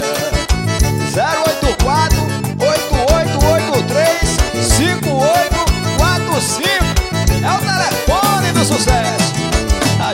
forró

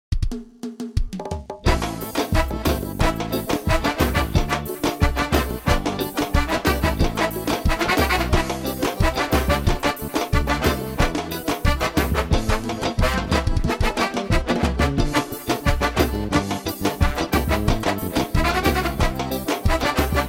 forrós

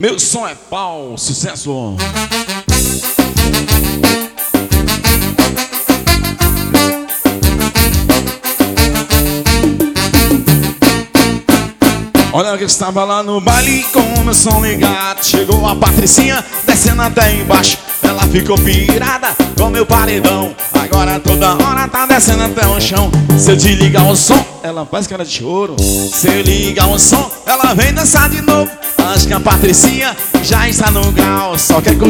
forro teclados

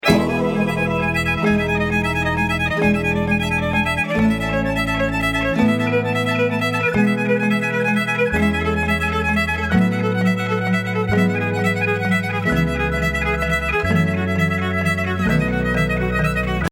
preludio da partita no3

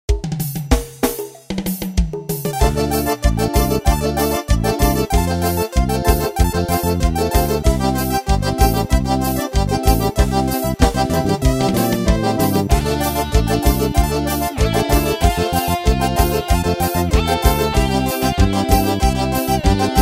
teste ritmo de xote sampleado

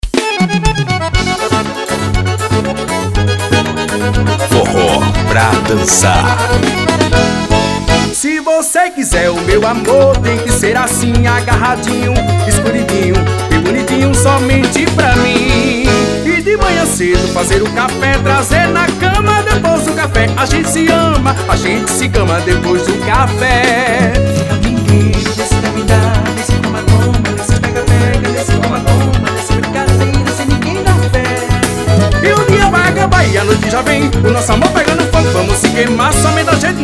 forro pra dancar

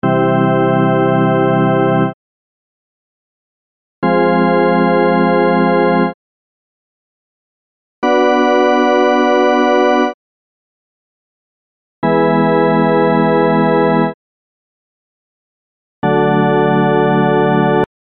acordes maiores e menores C F B E D G

